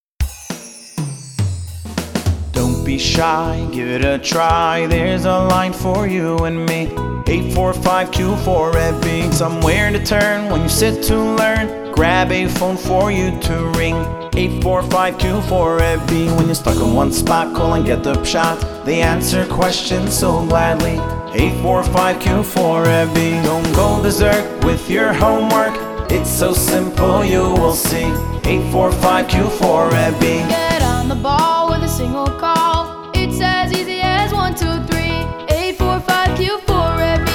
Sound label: Song; KEYNORSTUDIOS; Rebbi Song